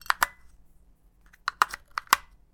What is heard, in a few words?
batts into clicker; electric